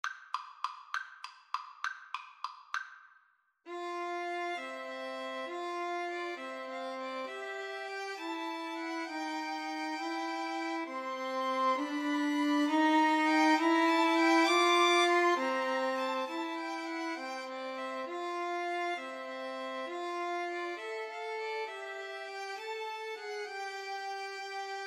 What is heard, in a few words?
waltz, Violin Trio